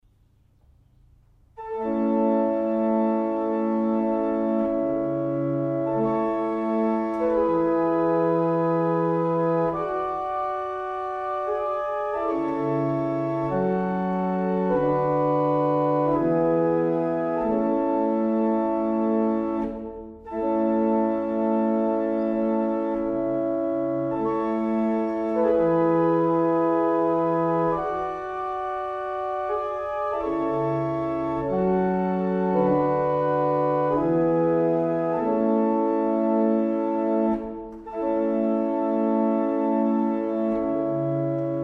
Single chant, barrel organs; Faulkbourne

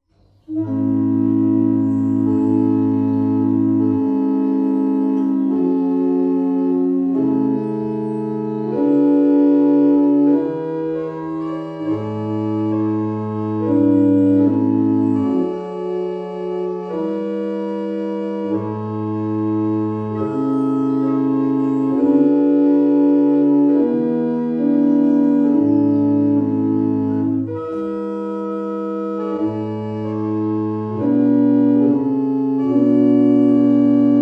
Evening Hymn, barrel organs; Raithby

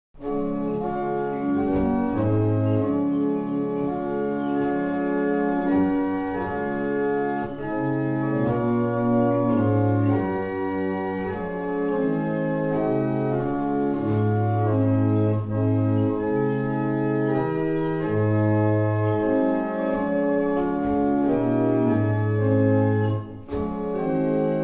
barrel organs; Bressingham

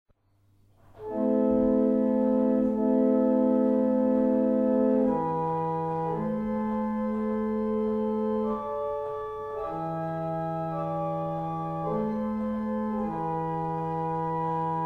barrel organs; Sutton Beds; Burford

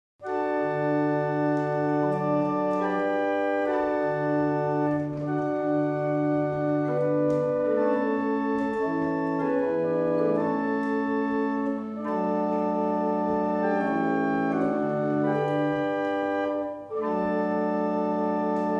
Double chant, barrel organs; Winwick; Robinson

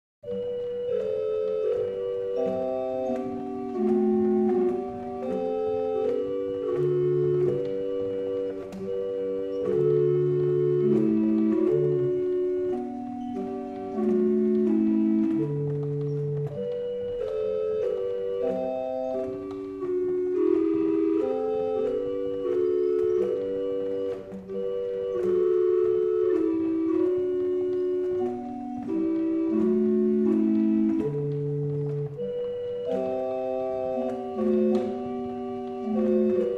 barrel organ; Liddington

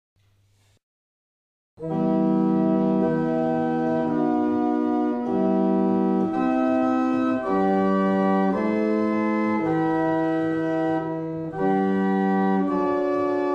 St Mary, barrel organs; Mattishall

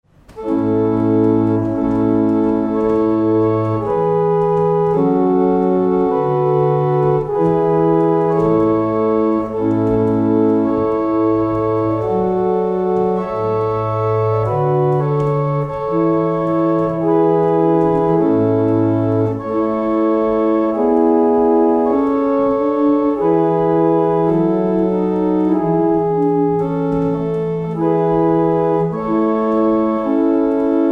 barrel organ; Letheringsett

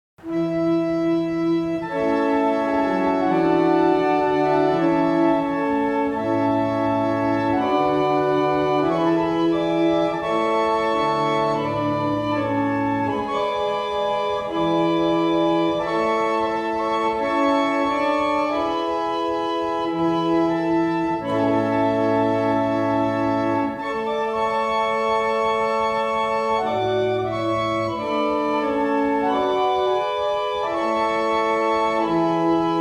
barrel organs; Hampton

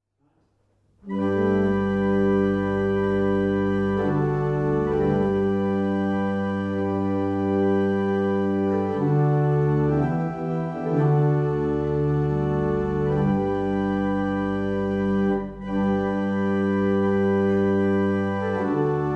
Single chant, barrel organs; Quantoxhead

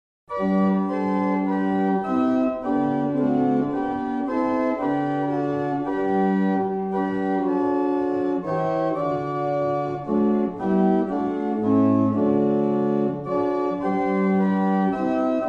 barrel organ; Mattishall

